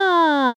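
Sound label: voice aa, female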